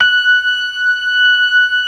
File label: ORGAN, LOWER MANUAL; Keith Emerson Signature Series